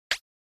Button Plastic Ruler

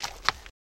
Horse Hoof Step On Gra

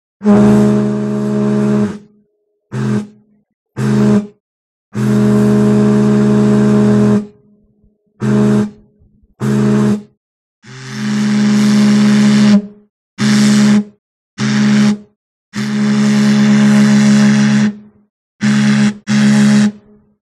Submarine Horn, boat horns

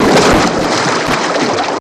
Car Water Cuts Off, car crashes

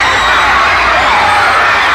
Crowd Mostly Female Screams

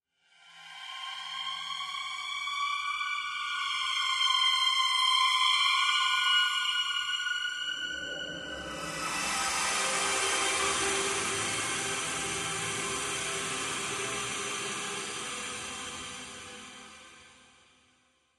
Sci Fi Atmos Howl, ambience

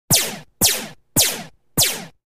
Synth Energy Zaps 10, zaps

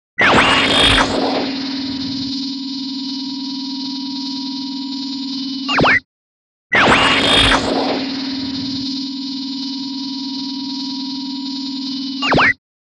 Gun Stun Stun Gun, lasers

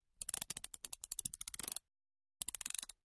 ratchet slow, ratchet wrench

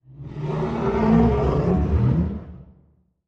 Animal Stinger; stingers & whooshes

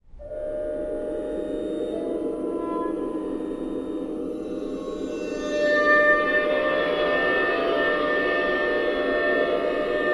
Metal Distorted Water

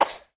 Baby Short Cough

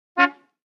1950 Car Horn Honk

